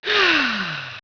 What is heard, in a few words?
Fem ah